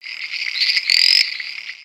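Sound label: Pets Insect Large Angry; creatures; Lego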